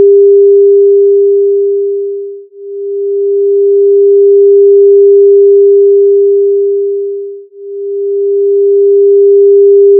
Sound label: stereo phase test linear